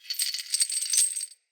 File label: Chain Creak; UnderCity